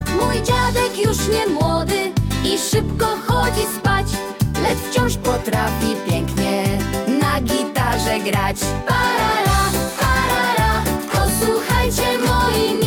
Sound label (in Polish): Gitara Remix